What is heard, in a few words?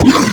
Flying Mouth Pain; Enemies; Warframe; ManInTheWall